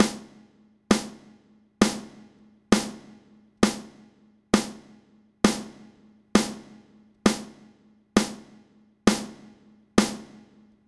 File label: parallel snare